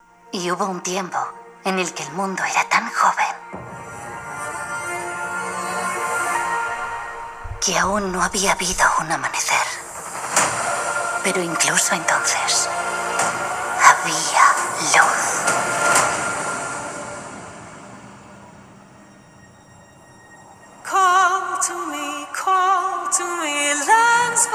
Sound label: Asus ROG Phone 6 Pro Audio